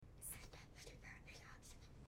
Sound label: People whispering